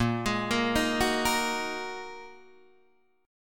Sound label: A sharp 7th Suspended 4th Sharp 5th, Guitar, DADGAD